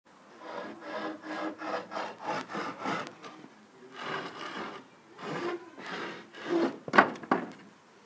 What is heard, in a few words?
gate